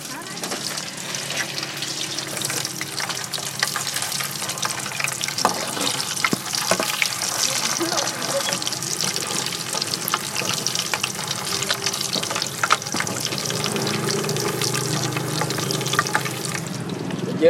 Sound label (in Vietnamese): Chảo dầu Frying pan